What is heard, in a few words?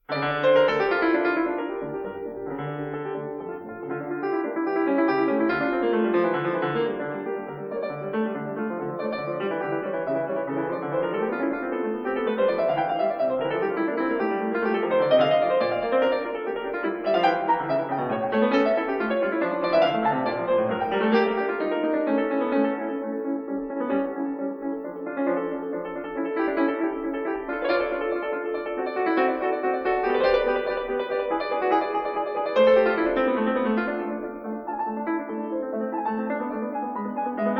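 No.6 in D minor Prelude, piano; BACH; WTC; BOOK II; Feinberg, Samuil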